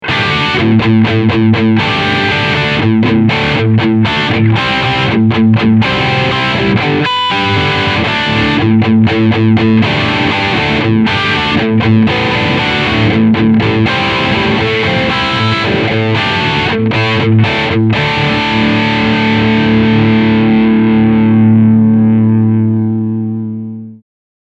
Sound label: Med Gain